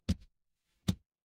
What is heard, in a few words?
feedback achievement